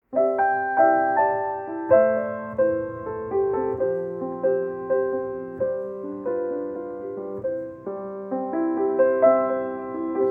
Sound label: speak gently vocalists group